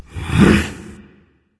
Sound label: nv grunt lift; cernunnos